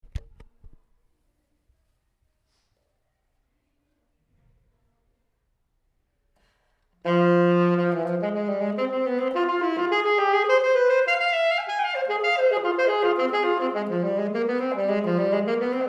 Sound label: Alto Sax; allstate